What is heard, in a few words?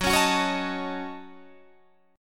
Gb Minor 6th Gb Guitar Drop D